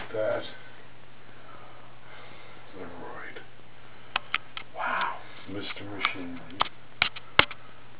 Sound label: toy room loud whispered wow